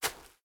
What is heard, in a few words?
grass, walking; factorio; Factorio 0.14.21